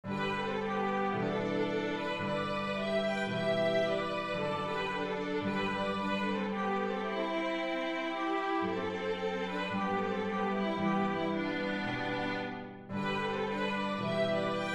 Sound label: jasmine full, violin